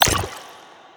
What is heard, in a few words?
water mag